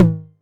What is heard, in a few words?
Tom Hi, Novation Drumstation; DrumstationV1